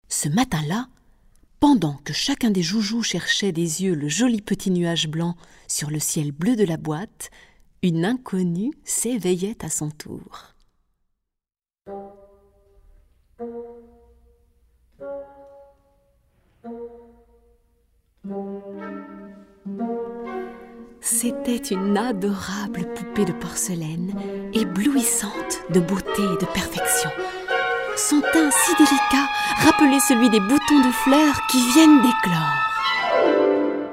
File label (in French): boite réveil